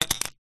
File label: lesiure toy ballbearing plastic maze set down on wood surface